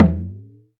DM5 Perc, Alesis DM5 drum machine

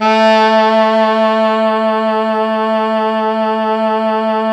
Pop Brass, A2 -L; Best Service ProSamples vol.19; AKAI

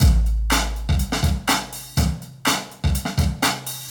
Meaty Loop, Drums & Percussion, Electronic; Sonic Foundry (Sony Creative Software) - Electronic Point Blank

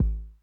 Kick Accent Low Tone Mid Attack Max Decay, 909; classic machines

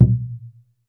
PUEBLO, American Natives; E-MU Formula 4000 Series Vol. 4; Earth Tones

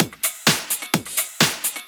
Clubhouse Loop 128 BPM

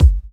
BD; Best Service; Dance Mega Drums

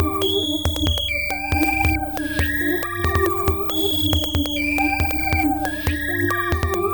Minimalism, Processed Percussion

